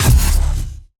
Sci-Fi Weapons-Source Sounds-Futuristic Sci-Fi Shot